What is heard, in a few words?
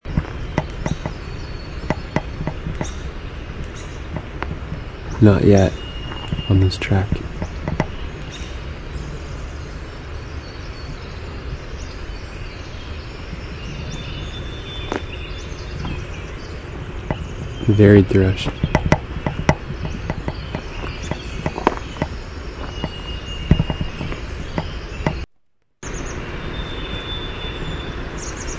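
Varied Thrush song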